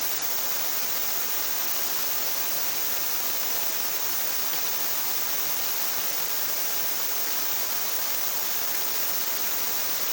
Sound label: ultrasound mic to detect ambient sounds from directional speakers